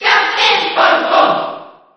Cheer JP; Captain Falcon; SSB4

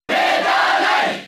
Cheer NTSC; Meta Knight; Brawl

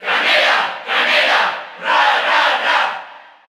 Cheer Spanish; SSBU

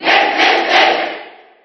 Cheer JP; Ness; SSB4

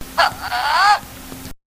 Wiimote Sound; Link

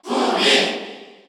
Cheer Korean; Jigglypuff; SSBU

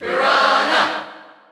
Cheer Dutch; Piranha Plant; SSBU

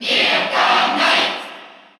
Cheer Russian; Meta Knight; SSBU

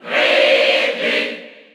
Cheer Spanish; Ridley; SSBU

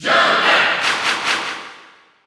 Cheer English; Joker; SSBU

